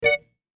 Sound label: guitar hit